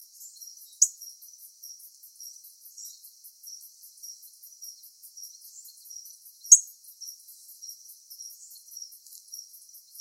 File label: coryphospingus pileatus